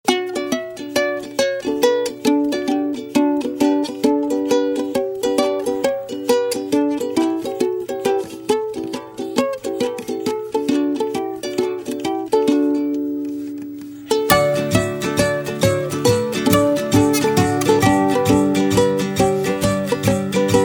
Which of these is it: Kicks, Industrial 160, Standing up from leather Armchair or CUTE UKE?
CUTE UKE